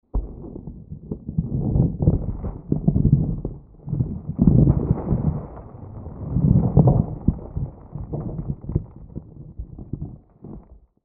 Underwater Remove, Underwater Remove Chest From Buried Sand; Nature; Blastwave